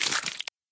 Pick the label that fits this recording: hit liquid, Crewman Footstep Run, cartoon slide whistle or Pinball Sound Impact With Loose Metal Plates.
hit liquid